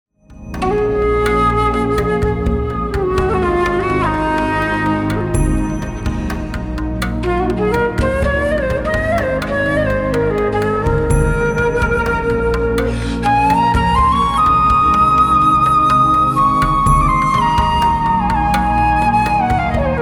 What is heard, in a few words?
Flute